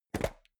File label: metal box small open; maschinen; metall; soundpool